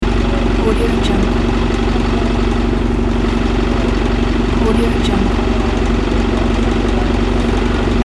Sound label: bus engine loop; abir187